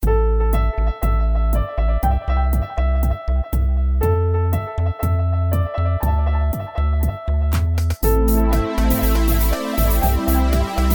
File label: Jingle